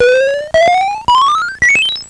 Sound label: pacman atari death